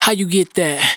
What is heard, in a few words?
hook, Dry Vocals; Raw Vocals; How You Get That; Slate Digital Omnivox Vocal Sample Pack